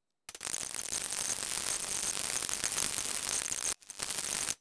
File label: drums